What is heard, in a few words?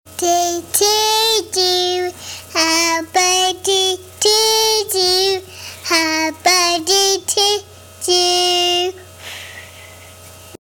Sings Happy Birthday